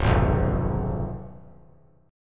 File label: C sharp 7th C sharp; strummed; C#7; JGuitar